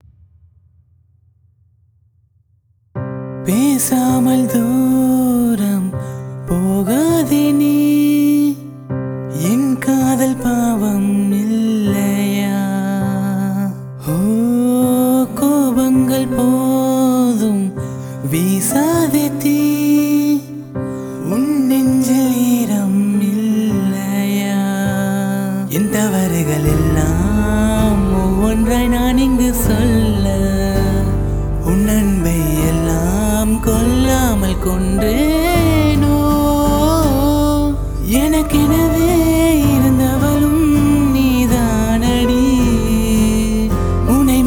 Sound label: Unplugged Cover